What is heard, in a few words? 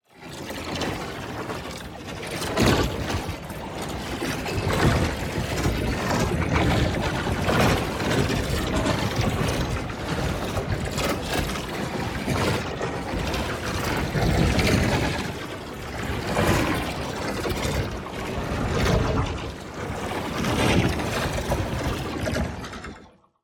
PARTICLES Propulsion Unit; boom library; Matter